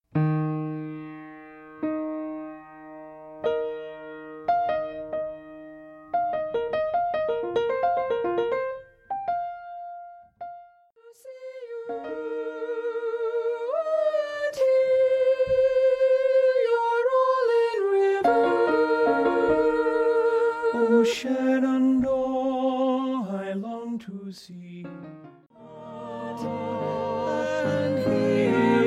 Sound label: Shenandoah SATB